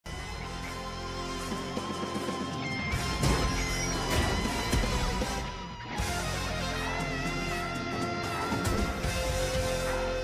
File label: movie climax bgm ringtone; mark antony